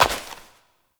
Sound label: Step Flesh; Warframe; Koumei